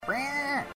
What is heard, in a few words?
reee